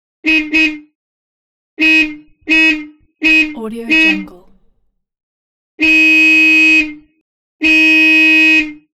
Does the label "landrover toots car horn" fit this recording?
Yes